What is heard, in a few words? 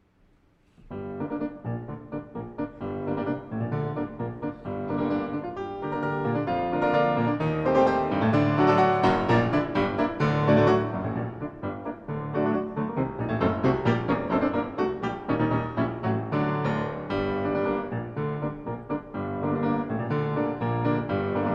Prelude in G Minor; 10 Preludes, Op.23 No. 5